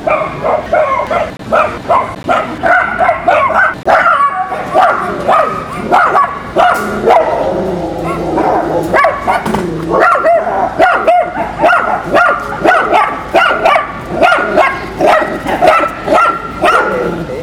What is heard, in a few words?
bark dogs